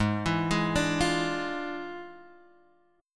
E 7th Flat 9th G sharp, chord

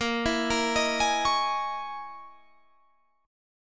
C sharp Minor 6th Add 9th A sharp

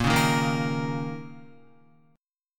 Bb Minor Double Flat 5th Bb, Guitar; Bbmbb5; strummed; JGuitar

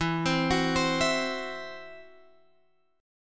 E Suspended 2nd Sharp 5th, Guitar, Drop D; 14,15,16,17,17,x

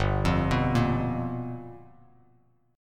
G 7th Sharp 9th G chord, Bass Standard; G7#9 arpeggiated; JGuitar

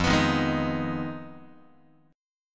F Minor 7th Flat 5th, Guitar; strummed; JGuitar

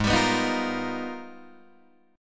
Ab Minor Major 13th, down, Guitar, DADGAD

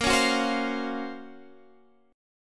F sharp Major Sharp 11th A sharp

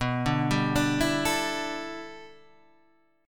G 6th Add 9th B, chord

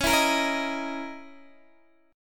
Db Minor 6th Add 9th Db down Guitar DADGAD

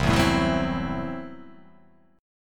C 6th Flat 5th C down

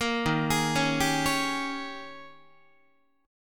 D sharp 11th D sharp, Guitar; D#11 arpeggiated; 13,11,11,11,11,12

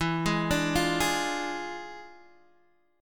E 7th Flat 9th E down Guitar Open G

